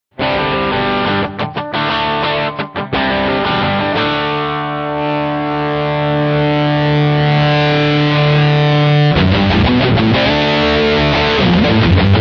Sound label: dualtone dynamic range, guitar